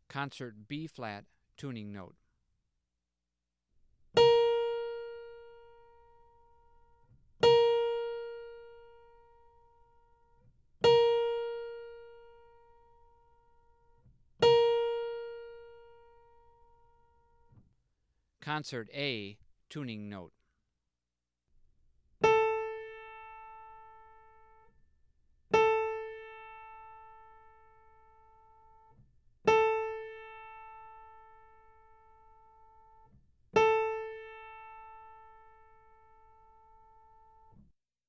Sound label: Tuning Notes; James „Jamey“ Aebersold Play-Alongs